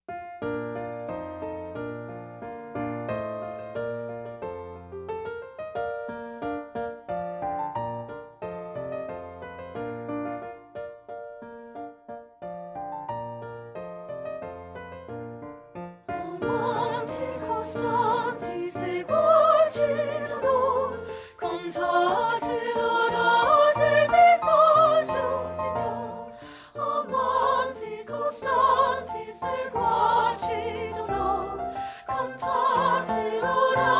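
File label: soprano; satb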